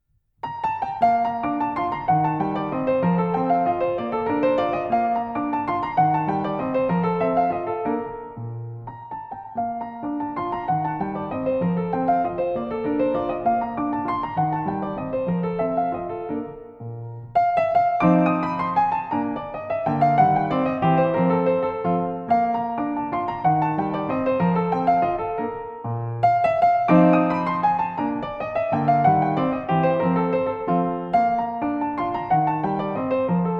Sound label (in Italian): Valzer pianoforte; von Antonia Volkert einem Madchen von 12 Jahren